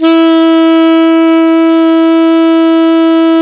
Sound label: ALTO PP E 3, SAX; Greytsounds - Philip Wolfe David Crozier's Saxophones